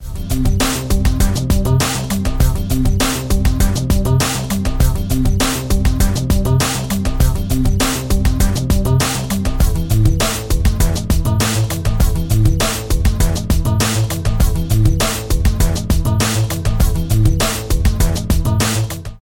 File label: Pop Music